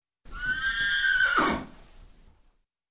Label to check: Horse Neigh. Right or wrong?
Right